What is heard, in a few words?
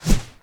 Quick Whoosh